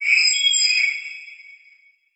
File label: Title Transition Crystal Glow; Production Element Title Transition